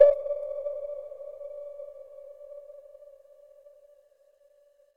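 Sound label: buoy sonar ping; Blind Drive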